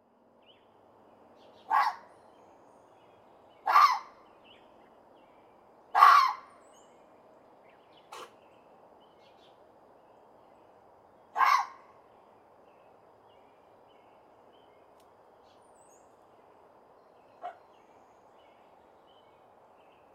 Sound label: Fox bark